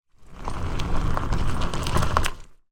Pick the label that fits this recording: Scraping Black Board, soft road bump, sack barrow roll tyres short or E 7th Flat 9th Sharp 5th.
sack barrow roll tyres short